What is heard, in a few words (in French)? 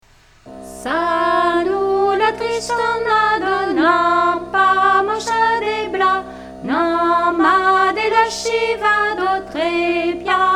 Polka piquee Vx Fa lent x3